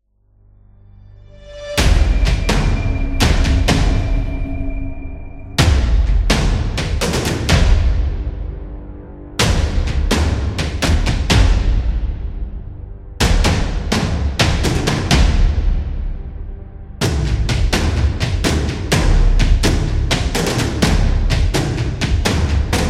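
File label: Army, epic cinematic trailer music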